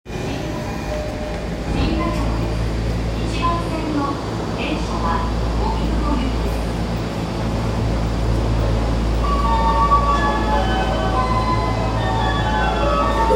丸ノ内線新中野西

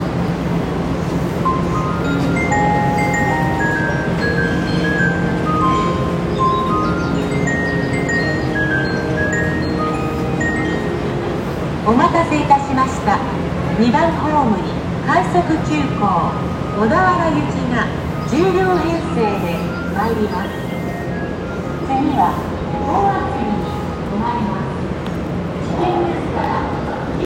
線海老名西東接近, train sounds; 小田急

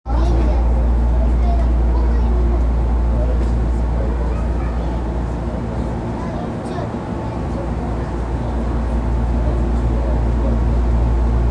東急東横線自由が丘北

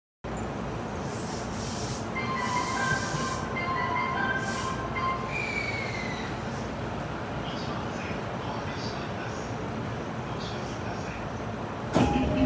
JR川越線日進西, train sounds

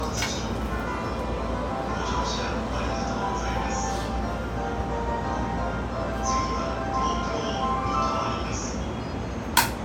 JR京浜東北線浜松町北, train sounds

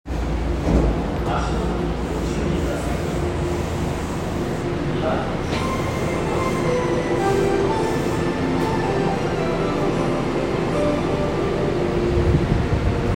東西線早稲田西, train sounds

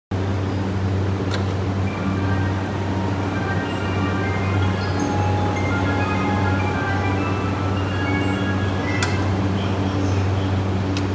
JR 山手線 大崎 CW, train sounds

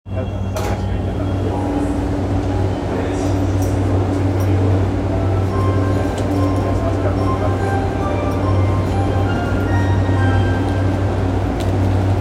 半蔵門線九段下西, train sounds

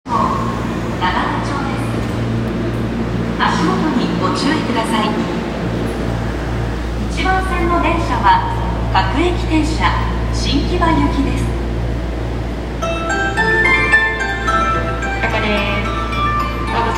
有楽町線永田町東, train sounds

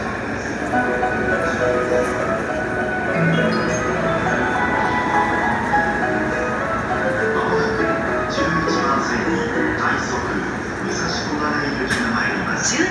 中央線新宿西, train sounds

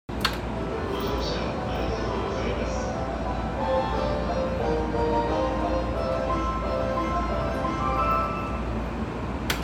JR京浜東北線大森北, train sounds

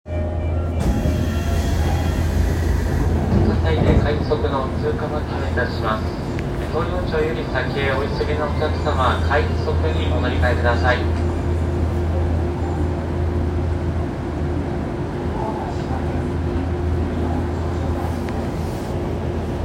東西線浦安西, train sounds

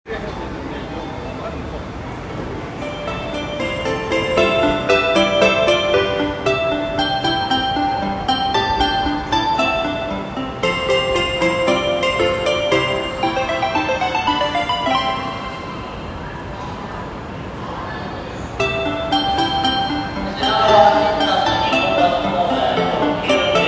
京急本線京急蒲田北接近

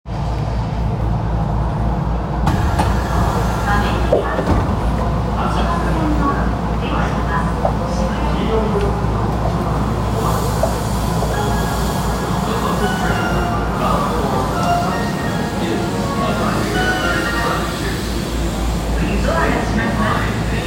銀座線溜池山王西, train sounds

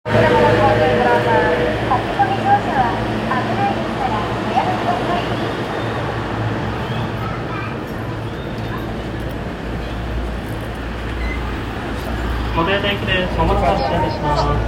新宿線新宿東, train sounds; 都営; 都営新宿線新宿東

